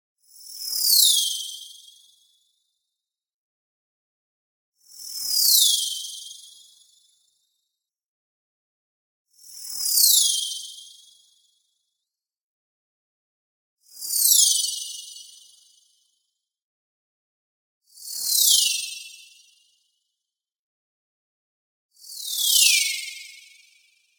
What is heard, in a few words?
whoosh, anime rise high; boom library; Anime Essentials